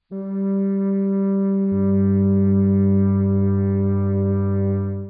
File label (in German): flöte g1 g; Intervalle, die man durch Potenzen von 2 und 3 darstellen kann